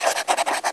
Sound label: writing